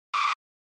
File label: Tire Squeal; SNES; Racing